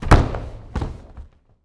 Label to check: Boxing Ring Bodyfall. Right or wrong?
Right